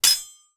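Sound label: weapon contact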